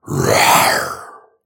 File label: growl; soundscrate